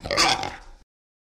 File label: Pig Screech Quick